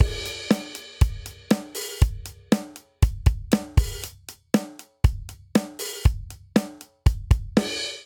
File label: Classic Rock Verse Variant 119 bpm; 4 4; MIDI Clips; Ableton; Factory Packs; Drum Booth